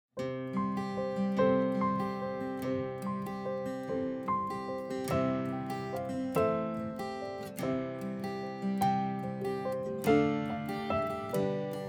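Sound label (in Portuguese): Deus Fez A Luz, O Vento, A Água (Backing), playbacks musicas criancas; primeiros passos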